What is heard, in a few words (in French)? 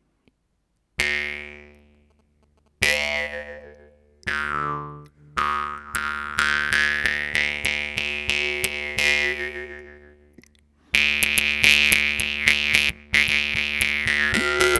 brass kind, guimbardes